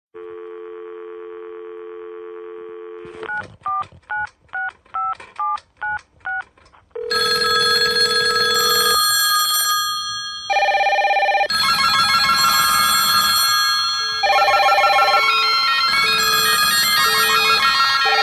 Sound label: instrumental; Allo